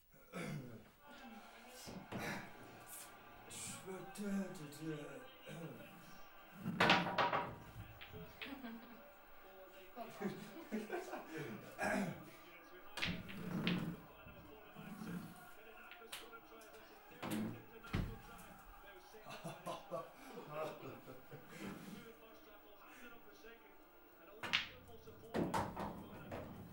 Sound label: Ambiance bar